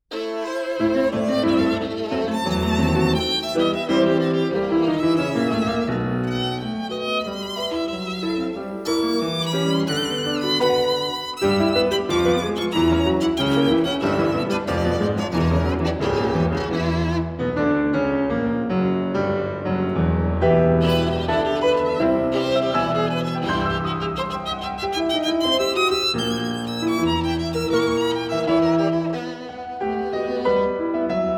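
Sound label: Violin Sonata, violin and piano; Elgar; I. Allegro: Risoluto; British Music for Violin and Piano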